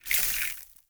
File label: Grunt Foley Shake; Enemy; Characters; Corrupted Sproutfolk